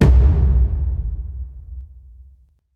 taiko low; smite; raijin

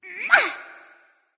grunt attack; smite; voicepack; medusa

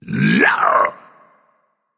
nv yay; Smite; ymir skin14

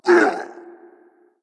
hitreact human male large

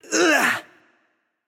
grunt lift; smite; tsukuyomi

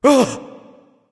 surprise; smite; thanatos

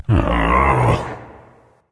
sigh; smite; voicepack; khepri

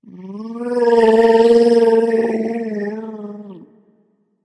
death; smite; osiris

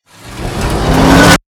buildup; ares; smite; ares v4 skin16

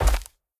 footstep; smite; god tiamat

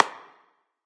clap; Smite; Zhong Kui

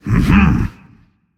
yay; smite; hades

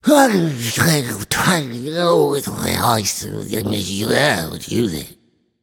grumble; smite; raijin

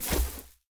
Footstep; Smite; god Kumbhakarna

